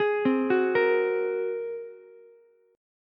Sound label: Bb 7th Suspended 2nd Sharp 5th Bb Ukulele, chord; Bb7sus2#5 arpeggiated; 13,12,14,13; JGuitar